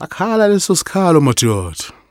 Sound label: Vocal Chirp, African Voices